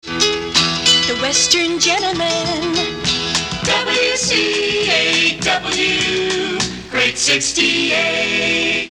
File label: jingle, radio; The Great 68; 1979 jingles